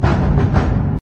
drums; doctor who; the masters

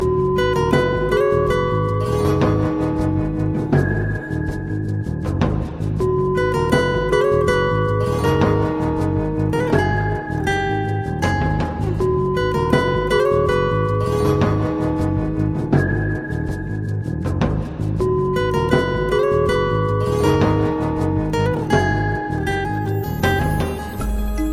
gitar theme; age of mythology

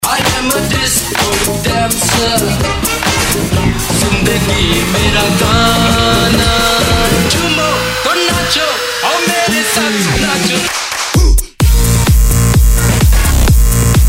disco

